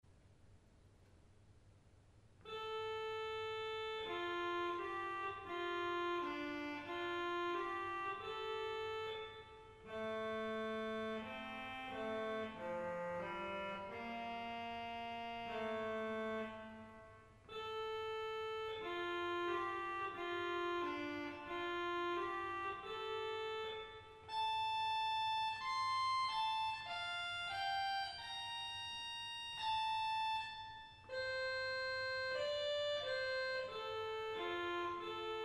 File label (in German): Krummhorn 8